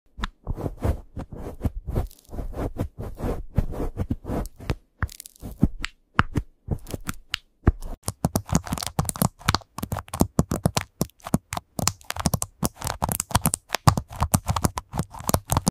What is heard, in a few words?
Keyboard asmr; اسمر کیبورد با هوش مصنوعی